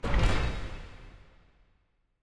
smelter puzzle close

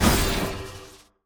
enemy boss dark binding projectile fast; bloom minigame

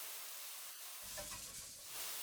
Rhino Smoke Loop

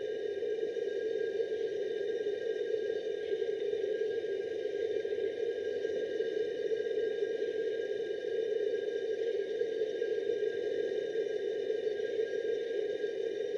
Light Hum

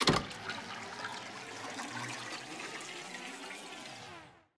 Fusebox Switch; Puzzle

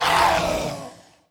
Enemy Death; bloom minigame

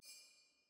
ui choice hover; spirit blossom

